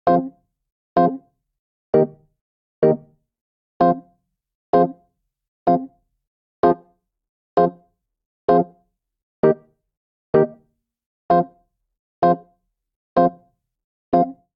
Progression Dub i - iv F Minor 64 bpm, Electric Keyboards; Ableton; Factory Packs